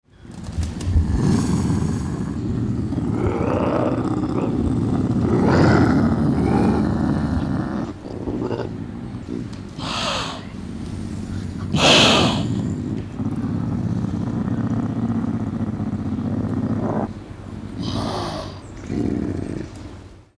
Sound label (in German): Luchs Kater Fauchen; artenkabinett